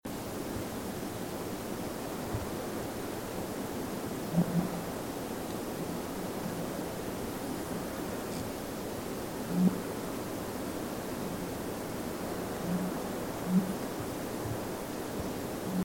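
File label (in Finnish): järvenkumina, ylänne; mke300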